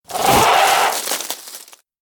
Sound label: Attack; Whelpgazzor